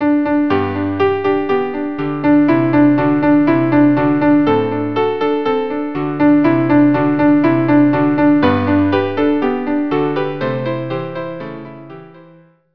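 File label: viola piano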